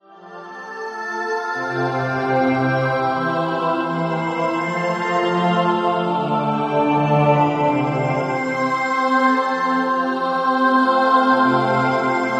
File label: ad libitum chorale